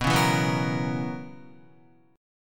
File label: B Major 7th Suspended 2nd Suspended 4th B Guitar; BM7sus2sus4; strummed; JGuitar